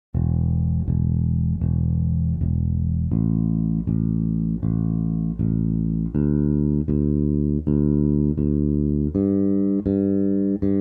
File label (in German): Anschlagsarten der rechten Hand